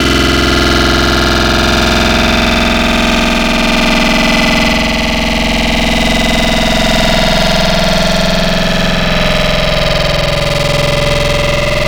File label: Growl Dino; Synthetic's Serum Collection; Echo Sound Works Core Tables